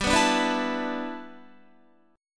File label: G 6th Add 9th G Guitar, chord; G6add9 strummed; 14,14,14,16,16,17